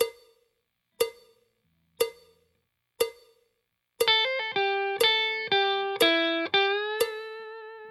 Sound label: blues tricks slow, guitar